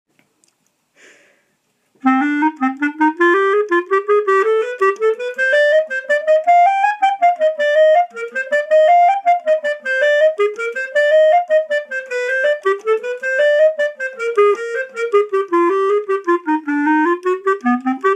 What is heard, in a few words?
clarinet